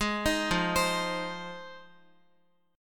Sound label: F Minor 7th F Guitar; arpeggiated